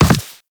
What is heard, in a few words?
Land Soft Default, Footsteps; Warframe; Characters; Operator